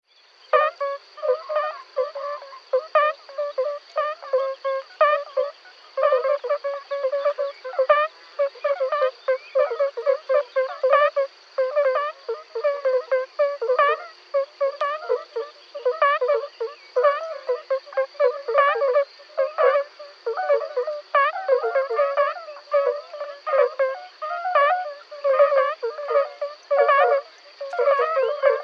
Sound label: Bombina bombina